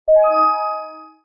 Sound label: sun Touch; Food Farmer